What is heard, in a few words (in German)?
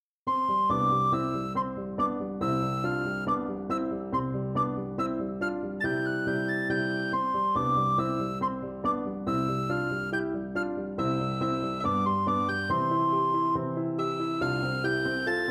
Klangbeispiel Rondo Klavier Sopran; Gelinek